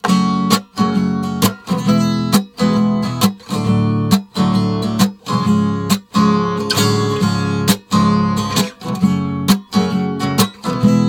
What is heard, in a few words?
Guitar; S.T.A.L.K.E.R. Clear Sky